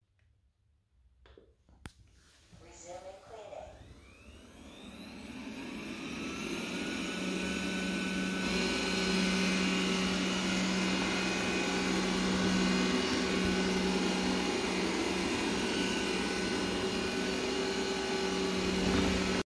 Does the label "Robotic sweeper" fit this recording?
Yes